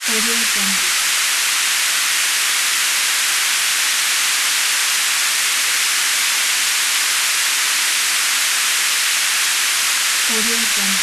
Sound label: white noise tv